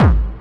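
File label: hard bass drums; Bazzdrumz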